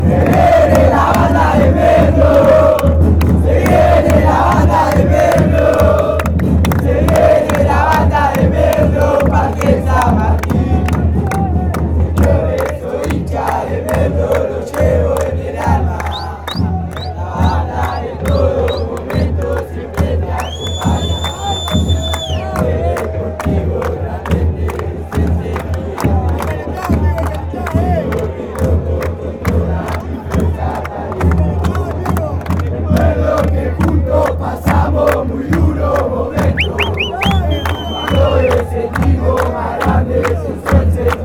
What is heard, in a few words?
chant, argentina; deportivo merlo